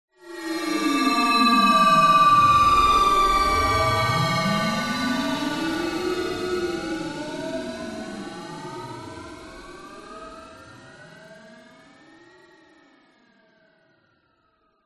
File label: sound design fm descending metallic shrill delay reverb